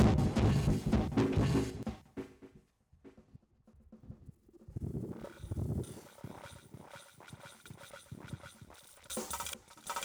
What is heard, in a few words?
glitch rev